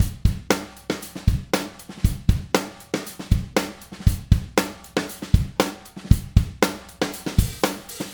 Tight Drums

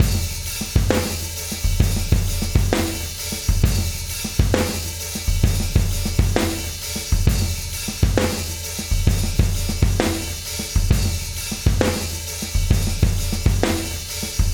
Rock 8 Measures

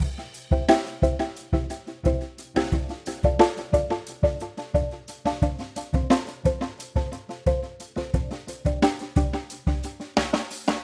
Vocoders

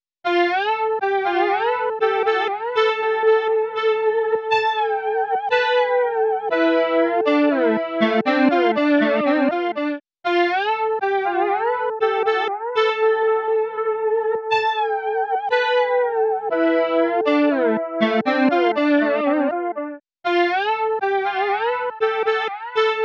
Synth Solo Delay

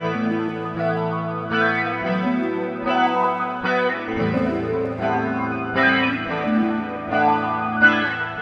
Rippling Guitar

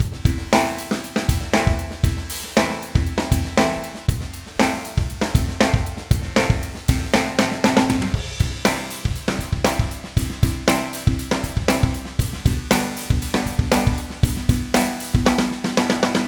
with Drums; BeatCoder

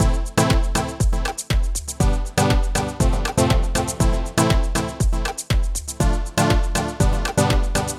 Basic EDM Echo

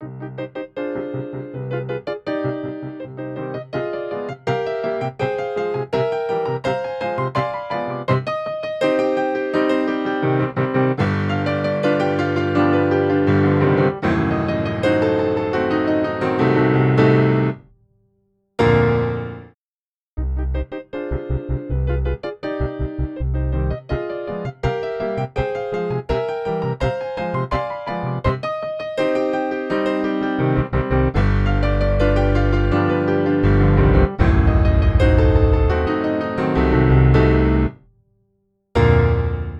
Grand Piano Enhanced Bass

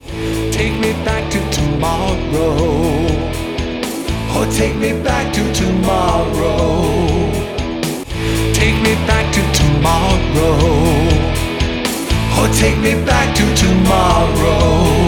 Multiband Limiter

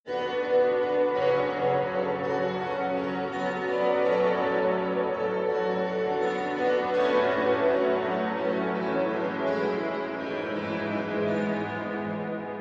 Pure Reverb

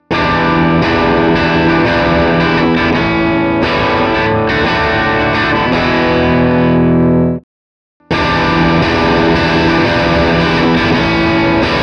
Amp Sim Sounds

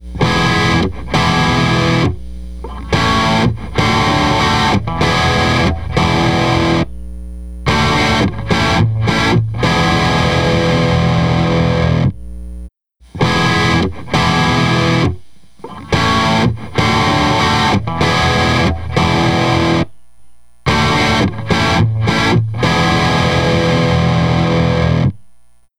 Hum then No Hum

